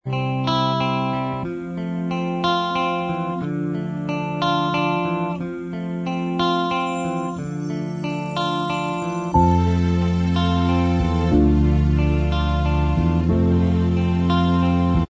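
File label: instrumental track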